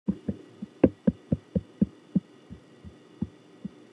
funny sound

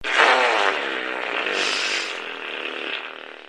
windy fart

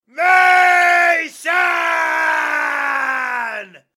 running battlecry

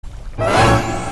abrupt suspense sound

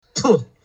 spit sound

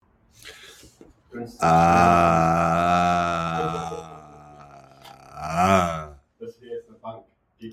eeaahhhhh metal

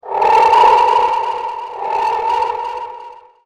frog croaking attack

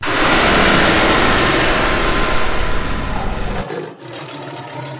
jumpscare sound; dancingghosts

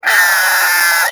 jumpscare; sniffles

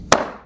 capri sun pop

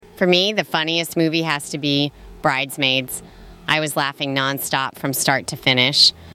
laugh non stop; bridesmaids

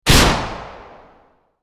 far shoot

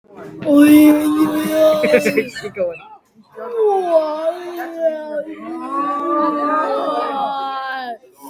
scream

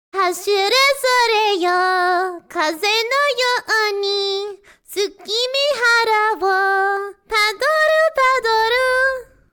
sings

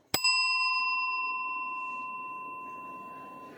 bell rings once

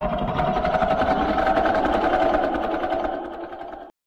figure click

